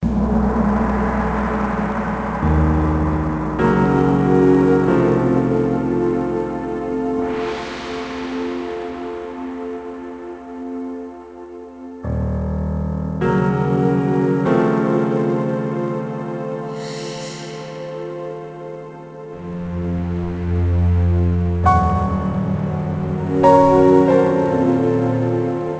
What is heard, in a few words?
MUSIC LEVEL